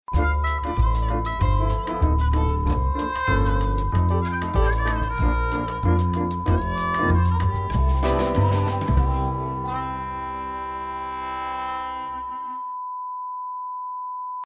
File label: groovy jingles